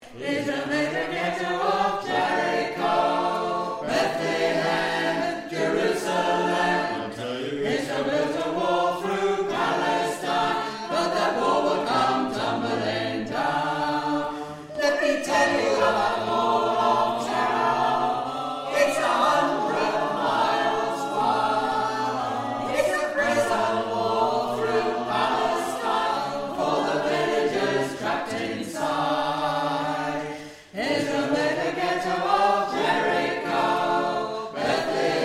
jericho all

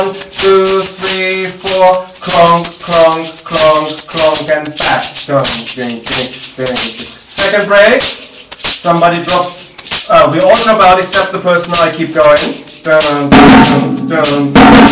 samba Break

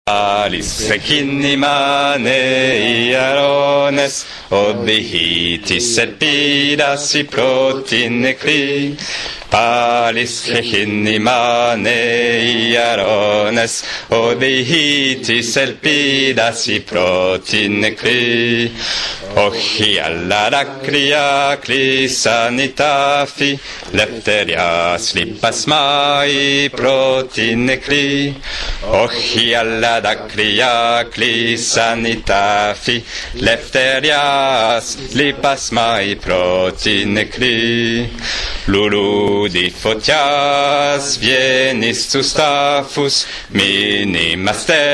Bass

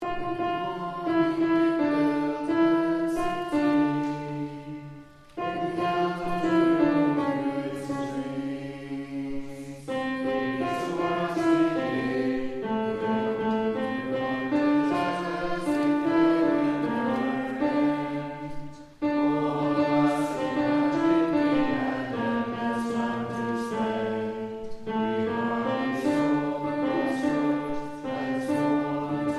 Alto

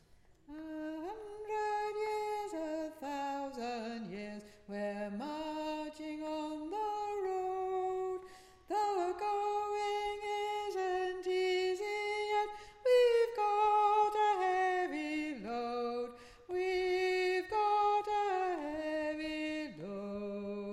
Bass; Chartist Anthem

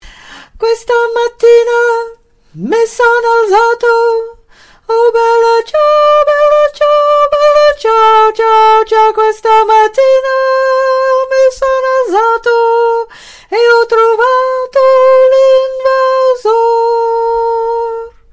Tenor; Bella Ciao

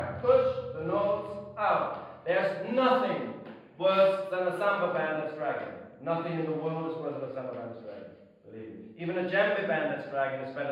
samba; Dont Drag

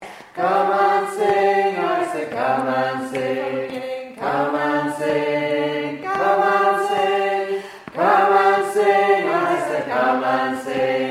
Bass; Come And Sing

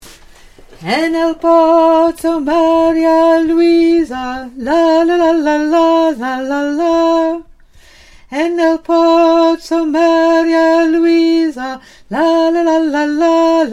Alto

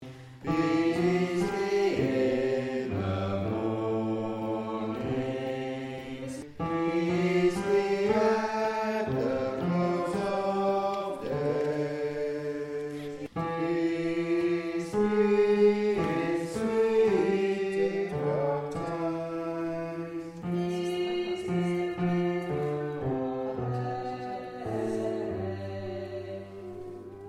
Bass

